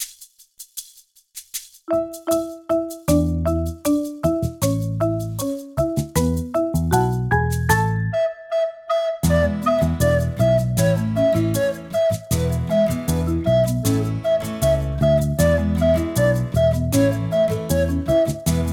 rondo a dur

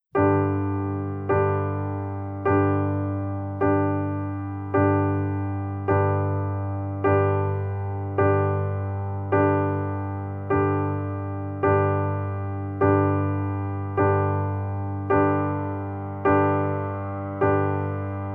dudelsack melodie

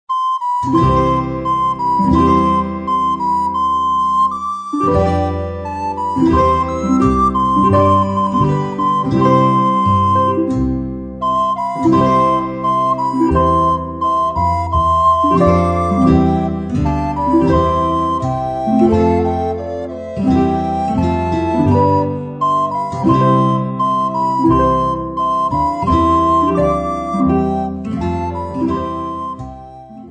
festmusik

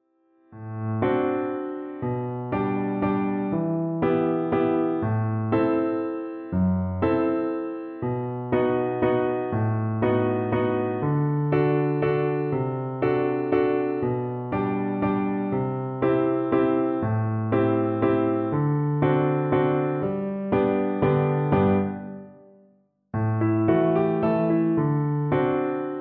walzer; f lehar

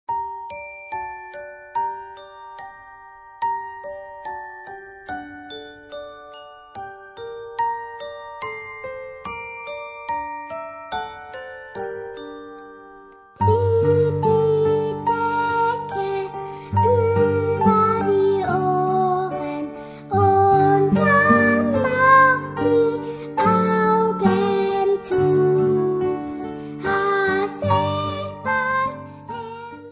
schlafliedchen

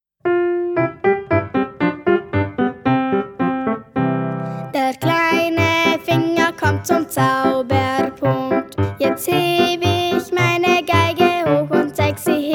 der geigenhaltungs song

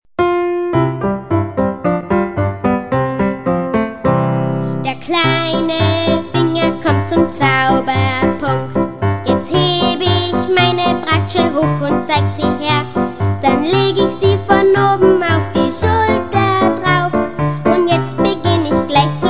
der geigenhaltungs song

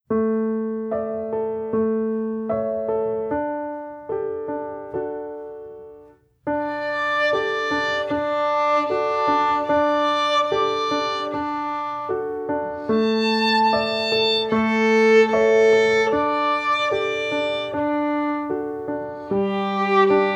schlafliedchen

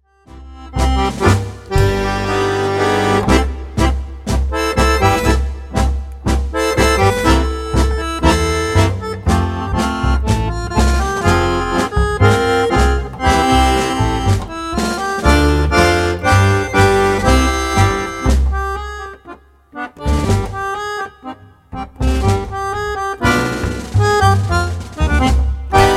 pariser tango

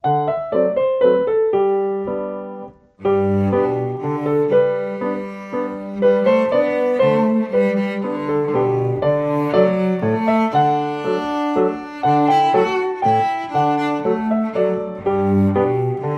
Wechsel Walzer

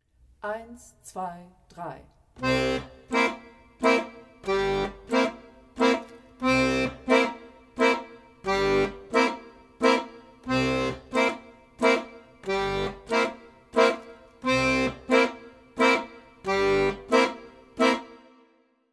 wechselbassuebungen mit dem septim akkord

